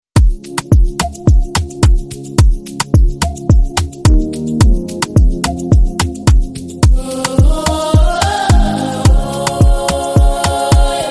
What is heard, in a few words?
Amapiano Version